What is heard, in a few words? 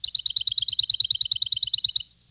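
tropical bird; Counter Strike Model Pack; CS:GO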